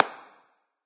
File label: clap; Smite; Zhong Kui